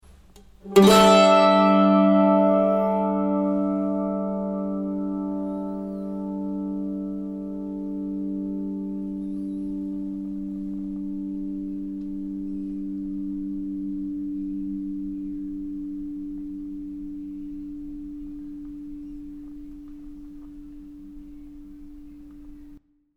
sustain, mandolin

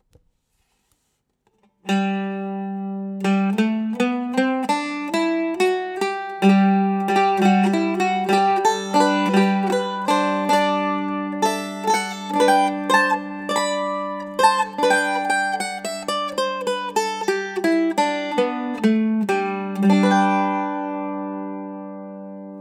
planxtyirwin, mandolin

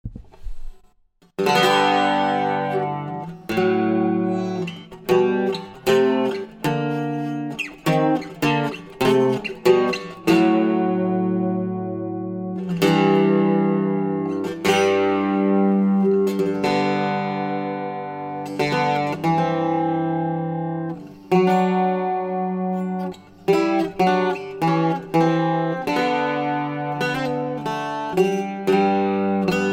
bouzouki random

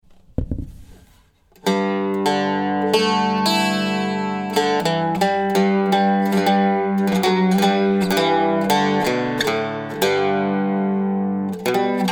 random, octave mandolin

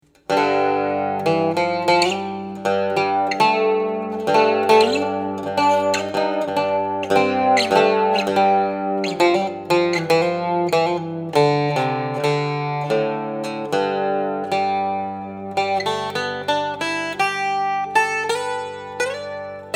bouzouki

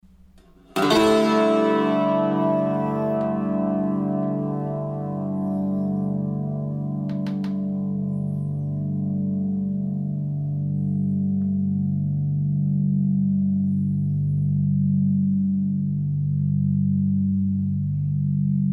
sustain, mandolin

